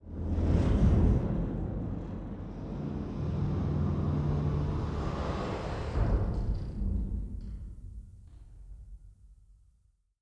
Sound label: Tunnel Metal Closing; Tunnel Nuendo Metal Closing; inside